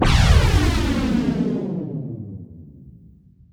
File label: DOPPLER; Distored Reality